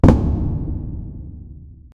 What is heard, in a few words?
sound effect of a heavy impact